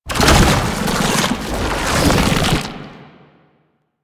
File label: Babau Turn Full, Foley; Proto Infested